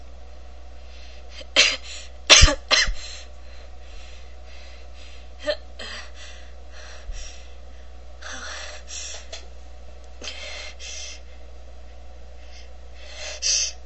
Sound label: some coughing, female